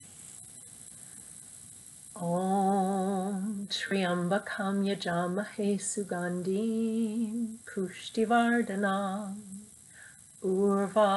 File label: maha mrityanjaya chant